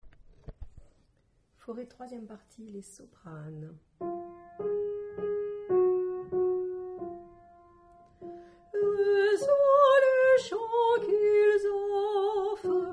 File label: Soprano